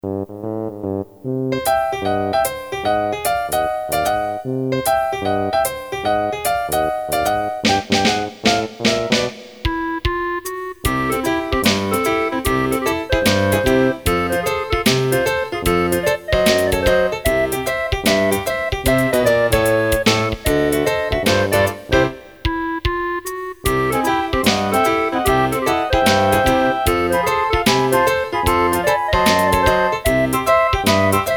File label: Casio GZ 50M; Leisure Suit Larry III Intro